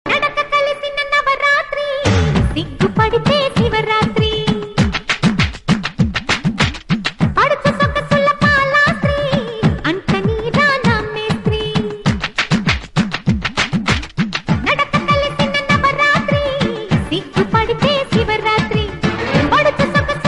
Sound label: telugu song